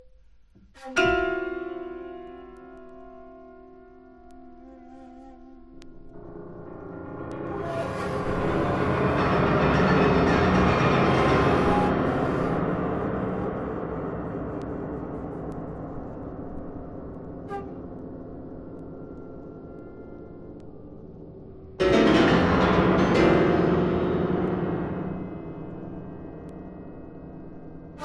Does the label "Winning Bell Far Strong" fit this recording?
No